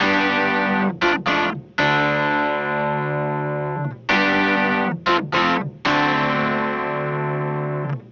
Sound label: Clavinet, 70s Analog Funk; Sonic Foundry (Sony Creative Software); What it Is!; Good Times